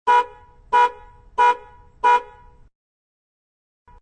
Car Alarm Short, Four beeps of a car alarm.; Cars; Vehicles

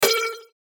button tonal flange; Multimedia; Buttons; Blastwave

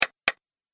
Game Piece Place, set down; Set Down Sound Effects; object sound effects

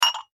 household glass ramekins x2 clink; Clinks; Objects; McKinney Sound

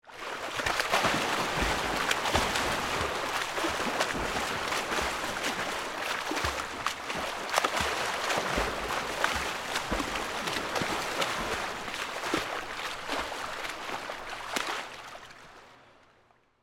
Pool Indoor Swim, Water, Splash; Pool Sound Effects; Pools (Sound Effects:Backgrounds); BlastWave

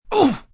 Grunt Oof, Grunt, groan, impact, male, short; Groaning Sound Effects; VoiceBox; Twistedtracks

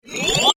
Short And Fast Rising Whoosh

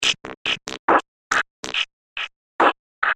Sci Fi Drum Loop 140 Bpm; Royalty Free Drum Loops

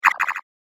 Delay Laser Echo Bleeps

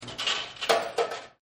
Coin Drop; Money; Objects; Partners In Rhyme

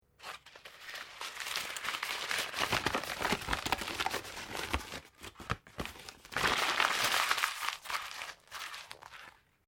PAPER UNSCREW; Material components for constructing more complex sounds; Sound Effects:Materials; Foundation3

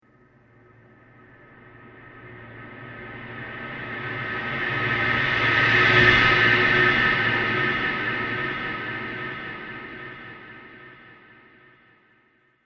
Disturbing Illusion Transition

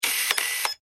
technology camera lens auto focus nikon f4; Cameras; Electronics; McKinney Sound

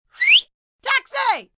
Taxi Whiste, female; Talking Sound Effects; Sound Effects:Voice; VoiceBox

